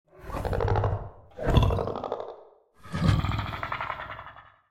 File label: xeno vocalize